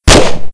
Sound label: Guns; VCI Blueman; Playable Tutorial Level